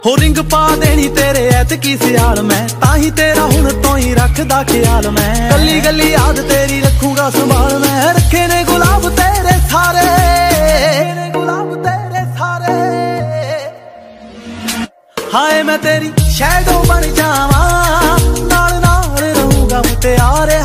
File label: bollywood mix ringtone; bollywood ringtones